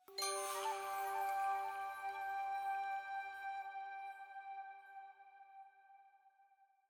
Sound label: item Jingle Flute + Chime G